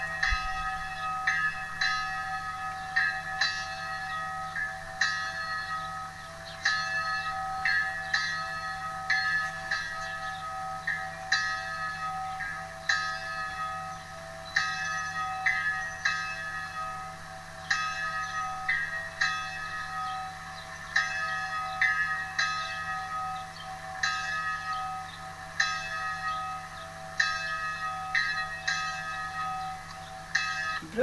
EGLISE CLOCHE; LOUBIGNE

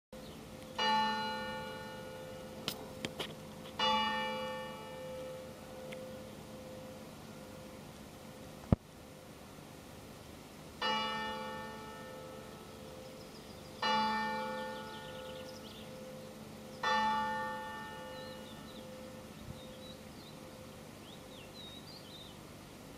ANGELUS MIDI; SAINT SAVINIEN 17

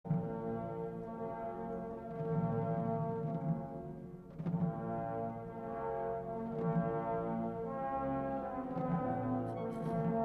MARCHE FUNEBRE; CHOPIN

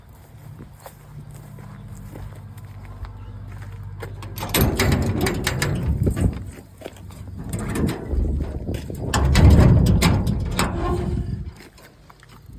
PORTAIL SORTIE; VILLEFOLLET 79

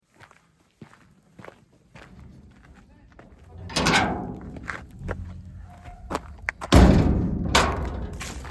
PORTAIL SORTIE, BRUITS DE PORTAILS; BREUIL COIFFAULT 79

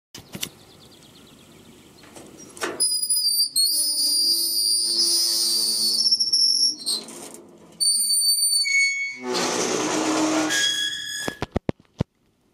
PORTAIL; SAINT SAVINIEN 17

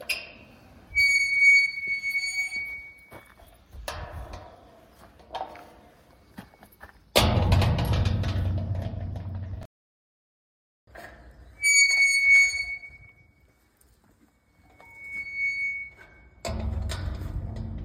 Bruit portail entrée et sortie; SAINT GEORGES 16

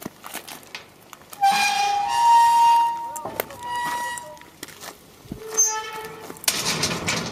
sortie, BRUITS DE PORTAILS; SAIVRES (79)

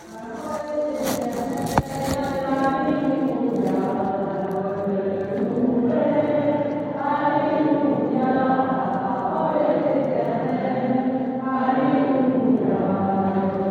ALLELUIA TA PAROLE EST ETERNELLE, EGLISE; LOIZE 79; PENTECOTE

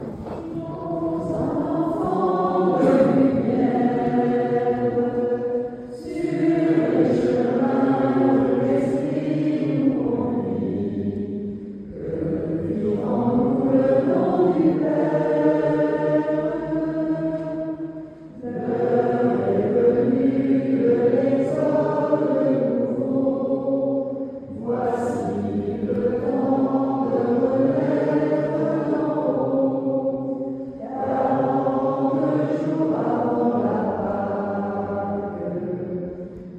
CHANT D ENVOI, EGLIS; MOUGON AD

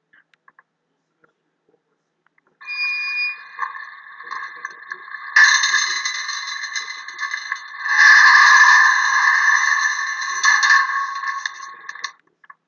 PORTAIL; SALLES DE VILLEFAGNAN 16

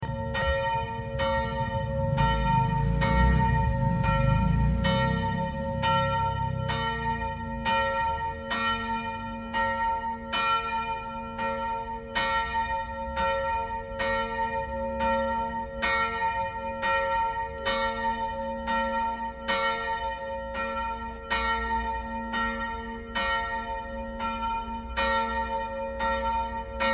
sonnerie fin de messe; SECONDIGNE 79

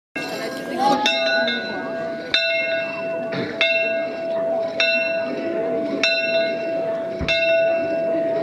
SONNERIE CLOCHE; JUILLE

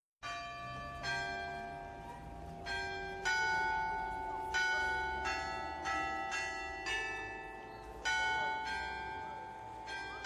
JINGLE AVE MARIA

